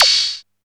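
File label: DM5 Hat Open, Alesis DM5 drum machine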